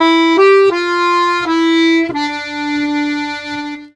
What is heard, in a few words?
Accordions; USB Soundscan vol.40; AKAI